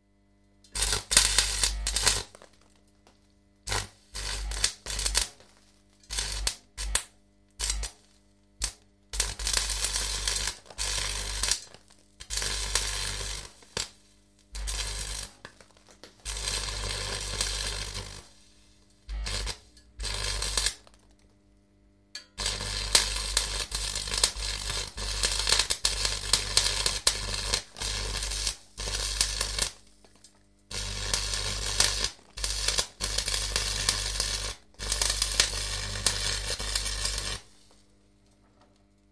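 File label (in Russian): welding metal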